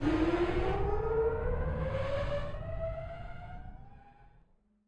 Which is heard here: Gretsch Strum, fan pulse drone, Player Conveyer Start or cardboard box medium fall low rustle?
Player Conveyer Start